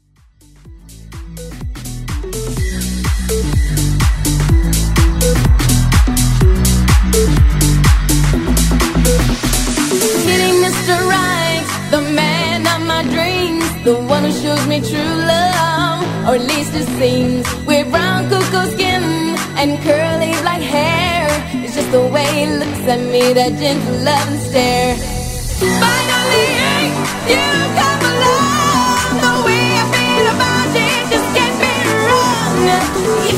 House Vocal

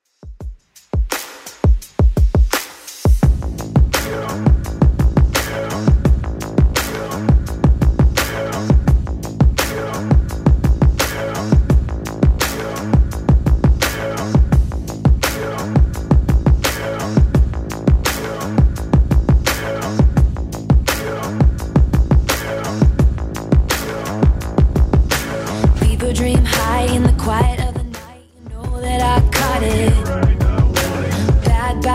Top 40 Extend Redrum 85 bpm CLEAN

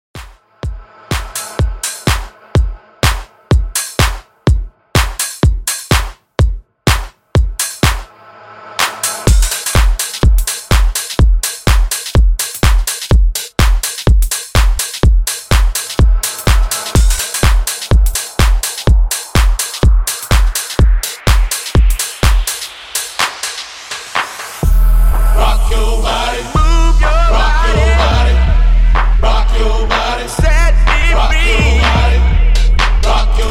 Transition Segue 125 120 Bpm Clean

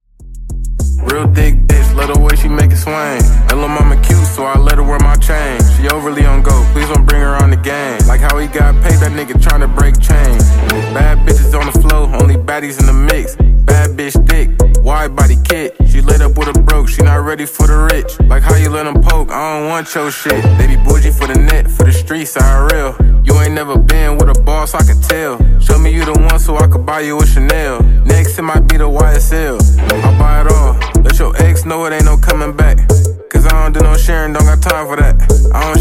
Dj Intro Outro 100bpm; remixplanet

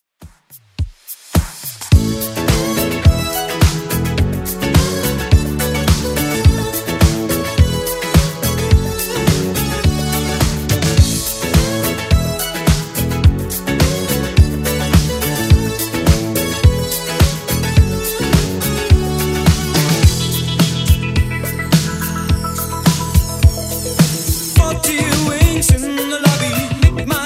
80s Redrum 106bpm

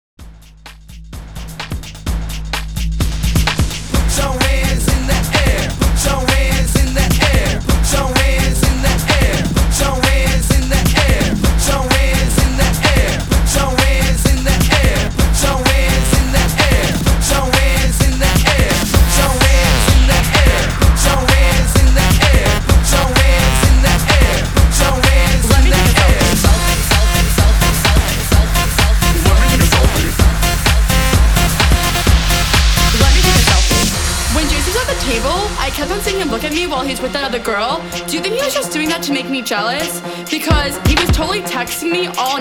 128 BPM

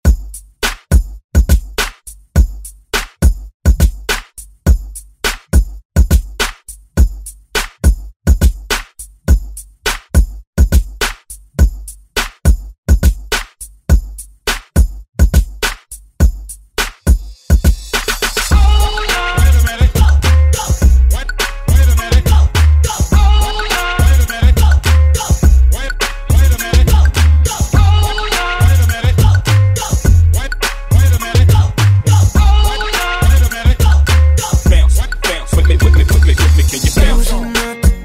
Hype Redrum

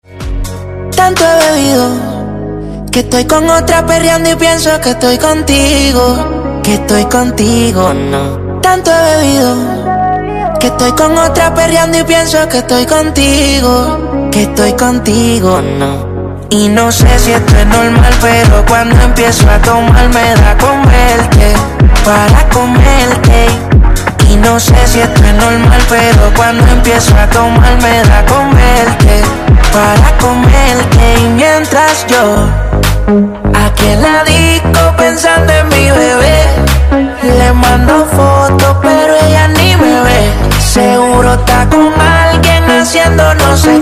House Redrum Intro

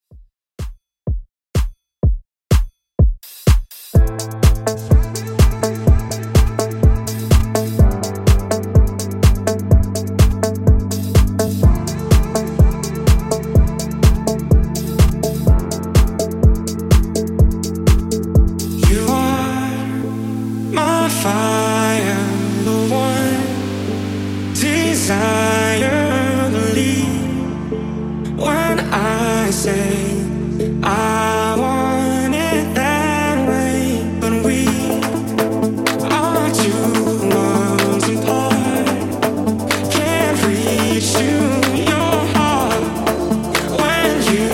In Outro 125Bpm Clean

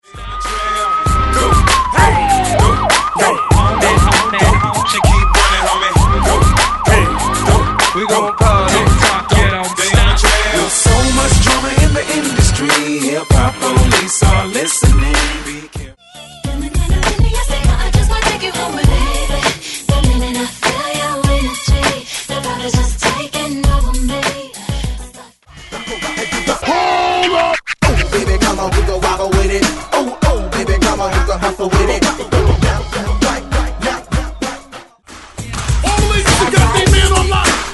Throwback HipHop RnB Megamix

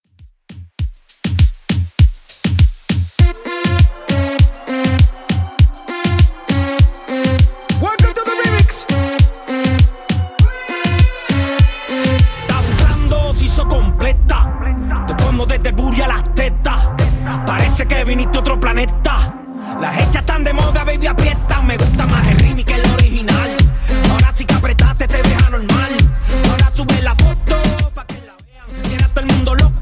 Reggaeton Redrum 100bpm

